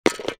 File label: GOLF